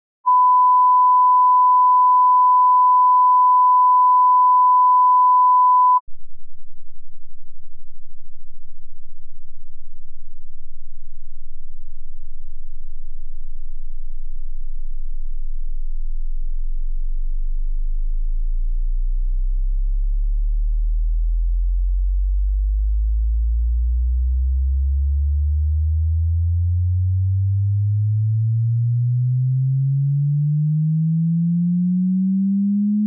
1khz, 5hz~22.05khz -15db L+R [frequence Response 1khz: -10db L+R]; Denon Audio Technical CD